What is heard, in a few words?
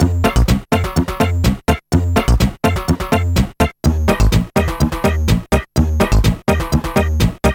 reggae; Trackers; Modland